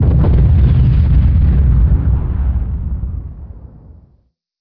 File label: interior explosion distant